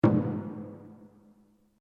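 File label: Drum Taiko mezzo forte Double Hit, Japanese Taiko Drum; Miscellaneous Musical Sound Effects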